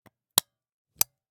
Camera, Pentax KS2, DSLR, mechanical, lens cap, remove, replace, XY; Eiravaein Works; Vaeyan IV